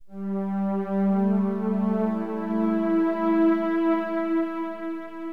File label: JP8 PAD, Keyboards; HipHop; LiveAct Pool 1